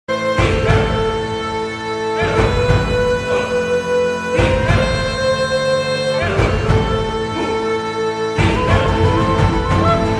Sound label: Celtic Music